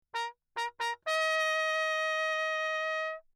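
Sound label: trumpet mute signal; Perinétventiltrompete; Lechner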